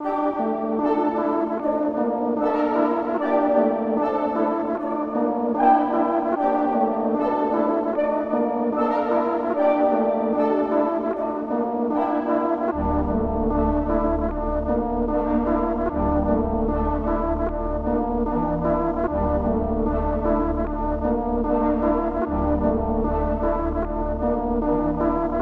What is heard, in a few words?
151 BPM D Min, Melody; Cymatics; 2022 Melody Collection Platinum Expansion